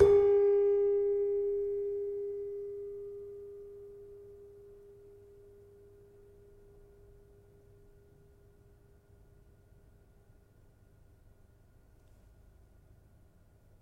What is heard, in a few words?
Demung, gamelan; gravityharps; timbrebox